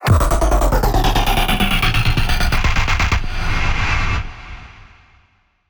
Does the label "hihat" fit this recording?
No